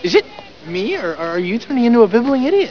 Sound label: bibbling